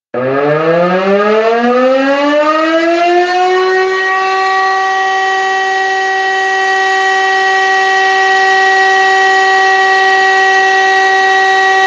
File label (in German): entwarnung